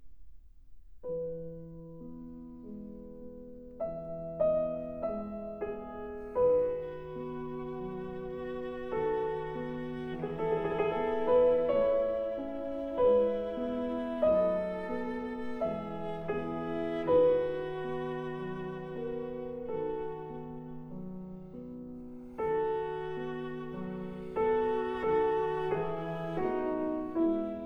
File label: Sonata for cello & piano in A major Adagio cantabile; Beethoven; Complete Works for Piano and Cello